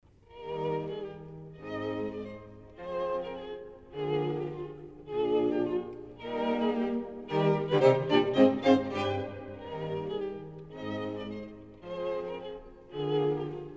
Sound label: String Quartet in E flat